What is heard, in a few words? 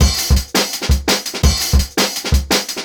168, Jungle Breaks; Original Jungle Breaks